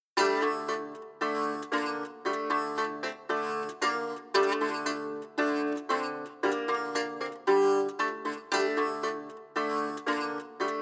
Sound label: strumstick dry